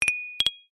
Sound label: multimedia notification glassy click pop up etc